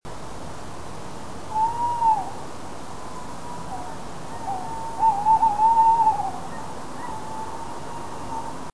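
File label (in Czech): Strix aluco, samec se pravidelně ozývá hlasem „hú-hu-huhuhuhu-hú“; V předjaří; Mapování rozšíření sov na východním Bítešsku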